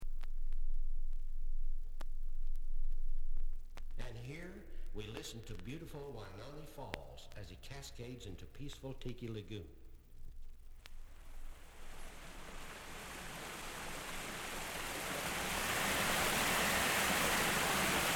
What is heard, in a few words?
Selected Sounds of Tiki Gardens